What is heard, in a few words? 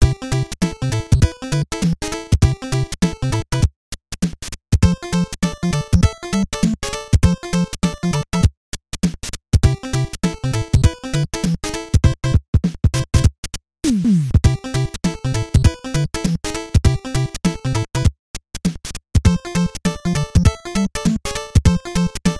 NES Grass Land; SMB3